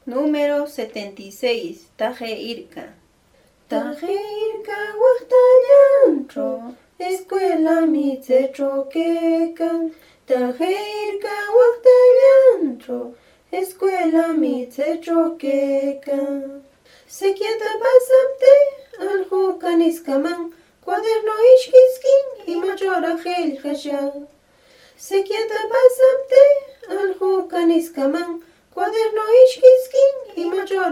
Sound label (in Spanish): cancion